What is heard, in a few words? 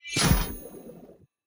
symbol remove land; reef raider